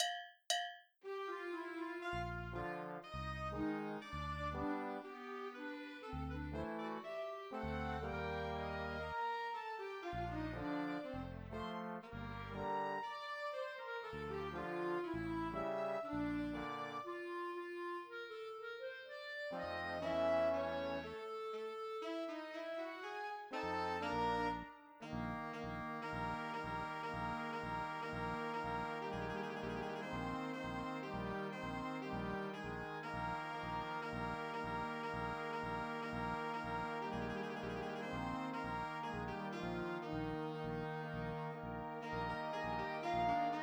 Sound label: minus French Horn Classical